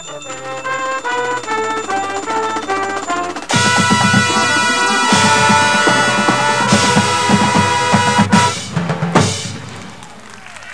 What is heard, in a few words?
opener, drumline